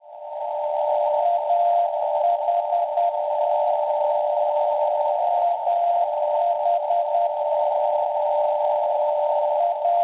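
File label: non-directional beacon 417 kHz; AH; Angelholm; Svezia; Schwarzsee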